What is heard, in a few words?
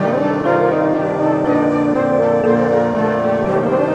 keys, jazz loops